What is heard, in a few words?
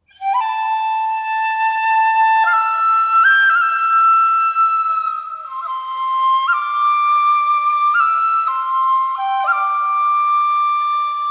Field BGM (Flute); Xenoblade Chronicles 3